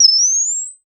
loot drop

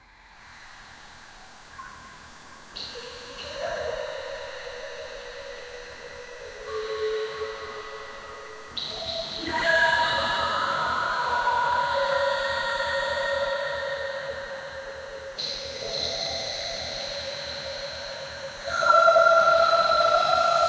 sewer sound horror darkne; liquid flow

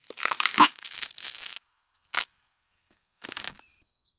a delicate wet tearing sound

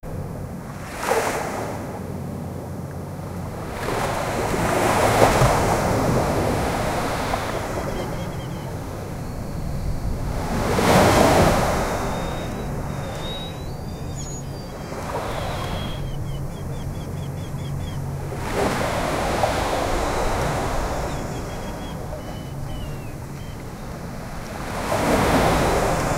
drone footage of, coastal

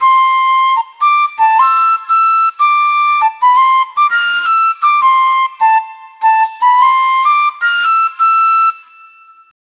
audio cue soft rhythmic; motif

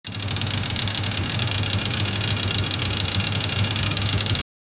sewing machine sound; fabric

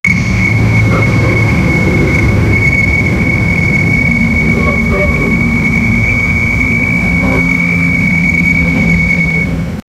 old aircraft warning soun

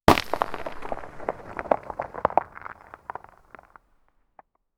huge rock roll down from, hill

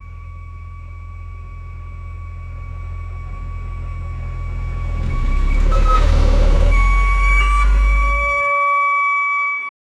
truck reverse beep with

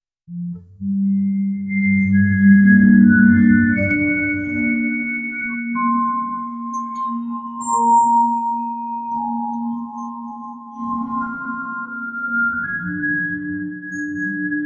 circus music on a creepy, circus

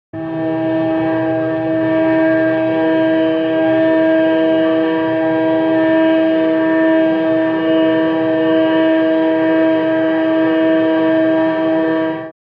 carnival cruise ship, alarm

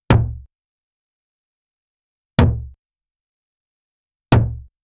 a kick that looks like, ball

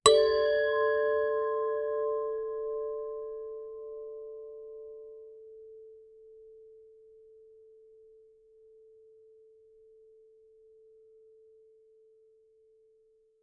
ultra realistic shamanic, healing

